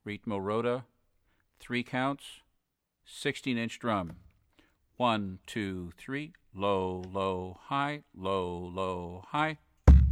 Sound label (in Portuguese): Ritmo roda